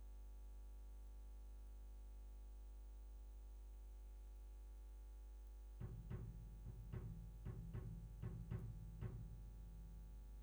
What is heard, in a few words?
Bass DI